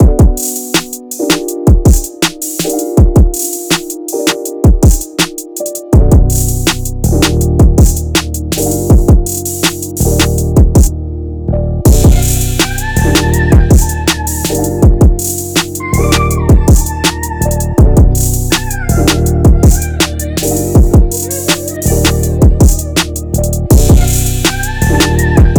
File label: Neo Soul Beats; Neo Soul Beat Licensing available for Television, Film, Movies